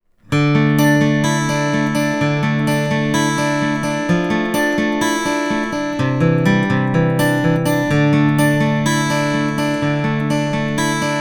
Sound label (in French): se electronics arpeges; m-audio sputnik